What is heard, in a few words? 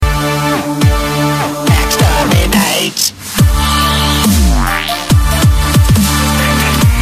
orchestral dubstep remix; exterminate remix